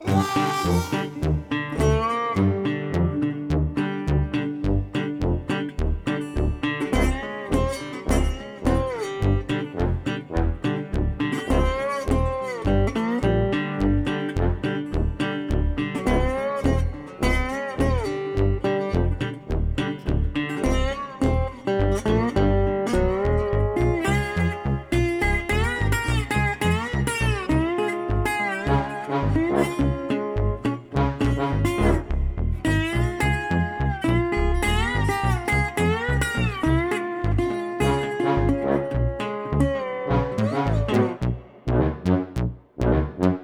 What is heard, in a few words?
Battle Synth Guit